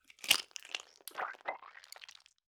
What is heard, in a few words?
SQUEEZE Egg; Nikkö Barrera-Amaya; Vegetable Madness